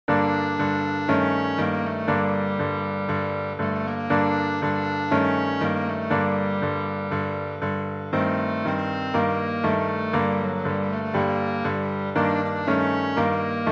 clarinet

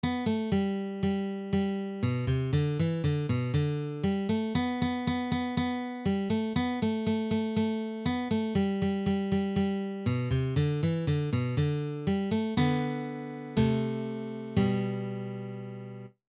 guitar

